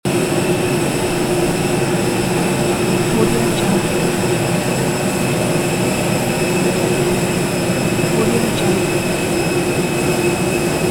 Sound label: airplane turbine on the runway loop; epx-lab